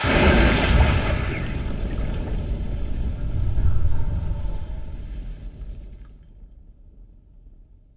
Airframe Water Crash In; DCS

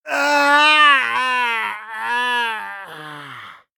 scav death, voice; Escape from Tarkov; scav6

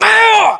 pain

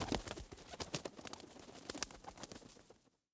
flap wings idle; Rust

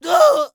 scav death, voice; Escape from Tarkov; scav2

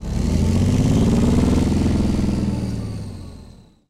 leo2a5 engine load, tanks; engines; War Thunder

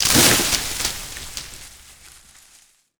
heavy bush impact

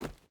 footstep boots forest run; Rust

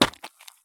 landing gravel; Escape from Tarkov

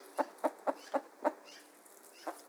cluck; Rust

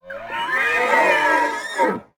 scream; Rust

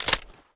weap magin plastic, weap; Escape from Tarkov

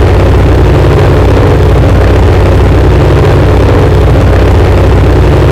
Aircrafts Engines Packard Merlin Prop; DCS

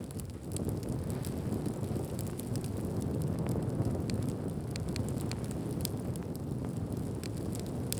torch burn loop; Rust

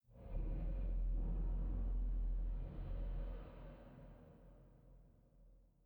sting metal groan; Rust

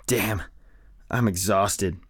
tired; Escape from Tarkov; usec2

